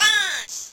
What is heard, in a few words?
dancer punch; HeavenStudio; space Dance